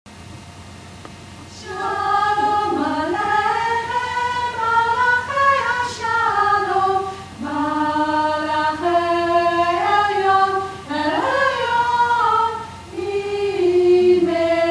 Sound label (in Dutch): shalom middenstem